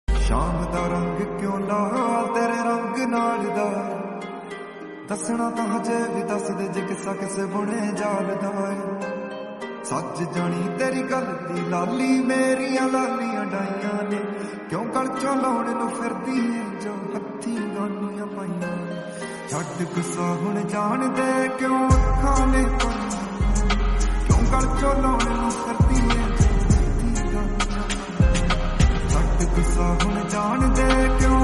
lofi punjabi

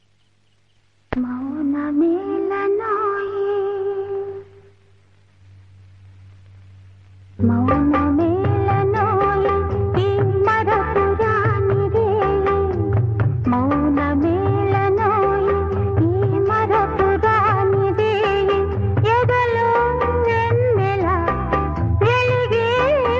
instrumental malyalam love song

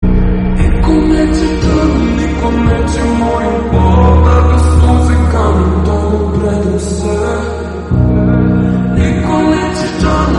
slowed reverb

